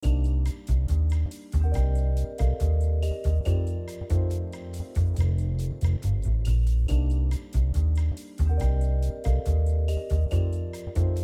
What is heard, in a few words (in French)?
Musique ascenseur